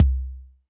Kik Rap, Drum Machines Alesis D4

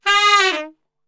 G#2 SAX, Brass Super Section; Best Service

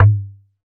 Talking Drum Down, Percussion; Roland BK-5

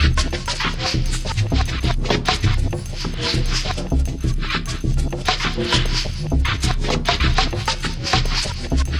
120 MAAR REV, Industrial Loops

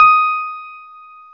CP-70 D#5; E-MU Formula 4000 Series Vol. 5; Protozoa; Vintage Presets

